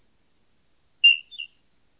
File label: nightparrot Ding-di-ding; Nick Leseberg